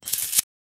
a light but distinct rust, forest

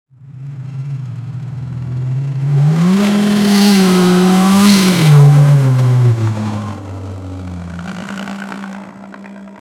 drag race car steering wh

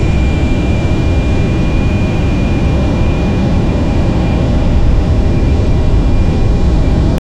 ultra detailed close up, air rush

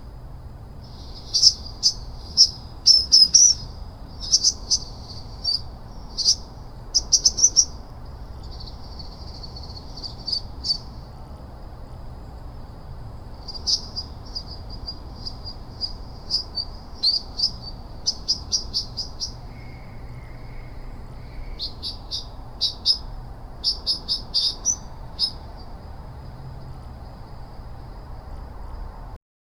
the sounds of swallows th, spring

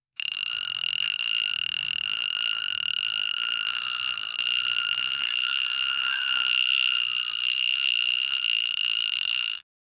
the sound of an old, fishing

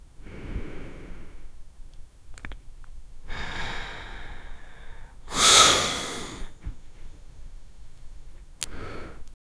smoking inhaling cigarette

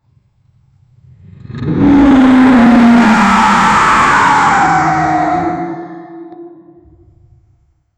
it starts with a womans, scream

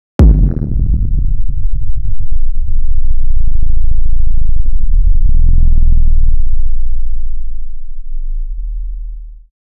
hit impact short punchy; saturation

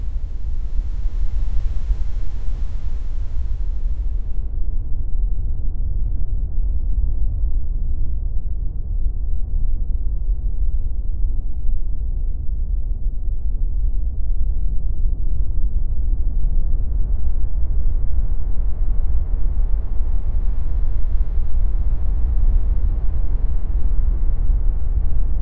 a burning sound that soun

